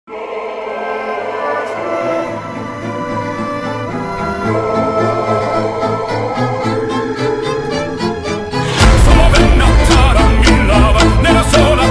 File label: Phonk